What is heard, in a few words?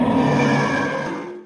Roar; Ocram; Consolaria